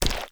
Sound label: step; block